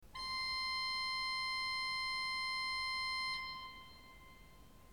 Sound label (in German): ton, orgel